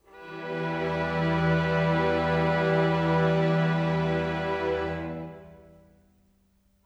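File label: Pad E minor, Orchestral; Sonic Foundry (Sony Creative Software); Orchestral VOL-2; Pads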